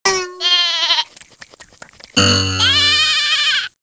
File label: sheep